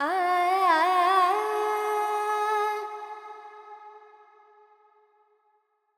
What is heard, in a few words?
FX Dorian, Vocals, Carnatic Female, Alaps, 70 G